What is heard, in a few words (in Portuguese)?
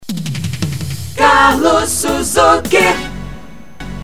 cantado; Rádio Suzuky Sat